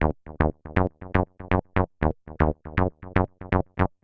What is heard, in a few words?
SH101 Bass Loop (120BPM), retro house